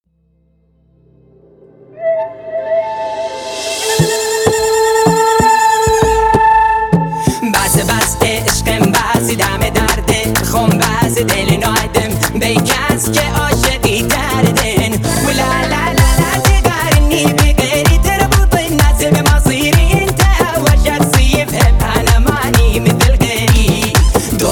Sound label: mashup bandari arabi; bandari jonob